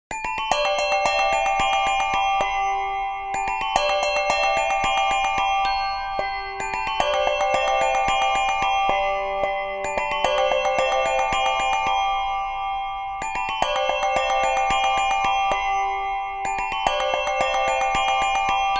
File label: default ringtone